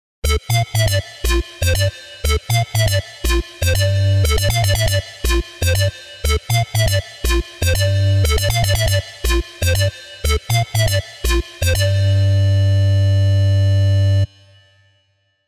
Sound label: Wavetable Basic Shapes